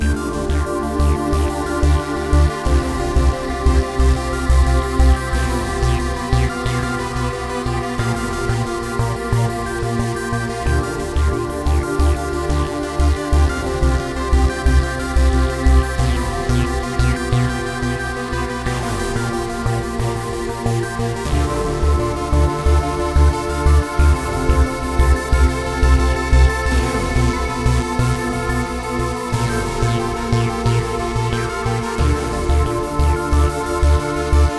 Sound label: Sky Game Menu Looping